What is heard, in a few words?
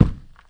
Foot Step Orc